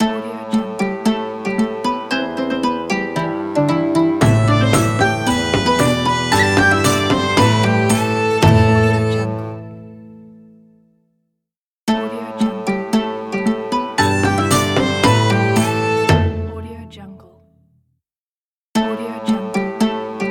the medieval fantasy logo

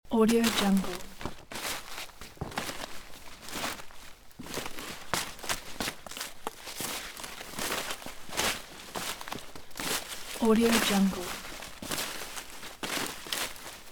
walking in jungle loop; fxprosound

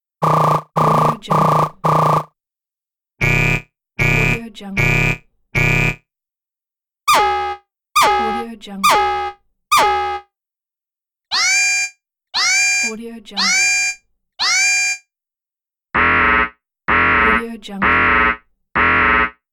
warning alarm gaming sound

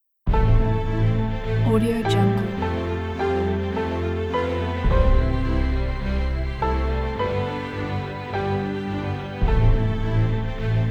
epic cinematic trailer

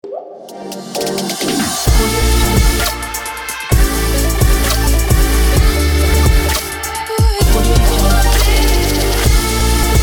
future bass logo pack

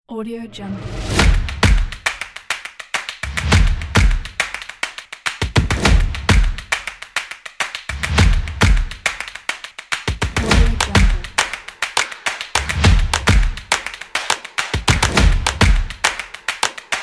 energetic percussion

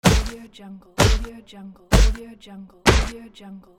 punch

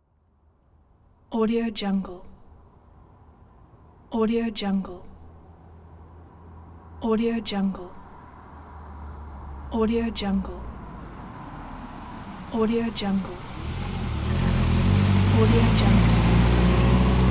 car pass by

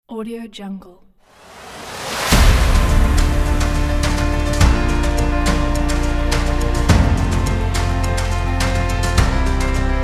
awards

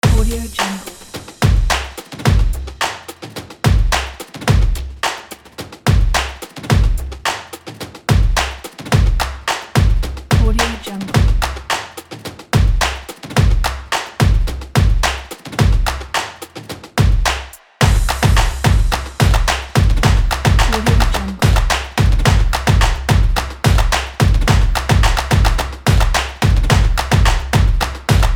claps; polarbeatstudio

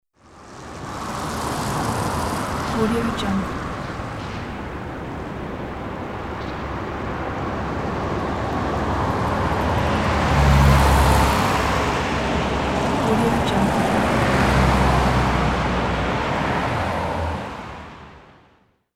urban city car traffic; soundfood

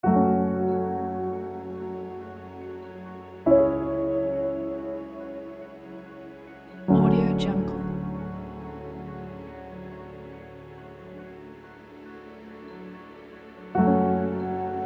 soundscape for life; marian

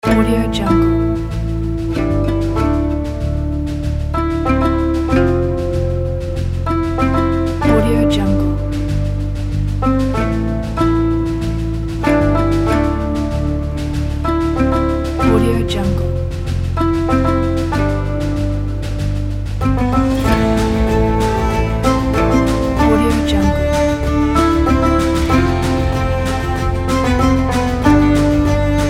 old western

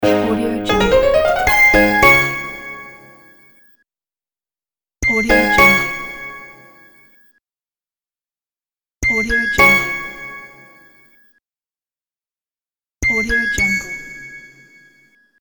short brand logo

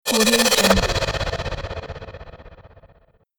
cinematic shift

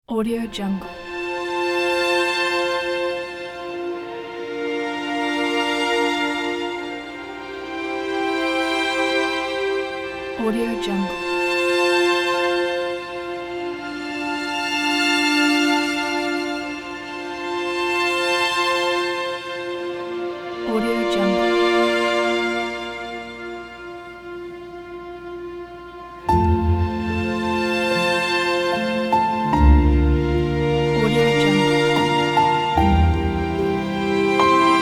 beautiful love string movement

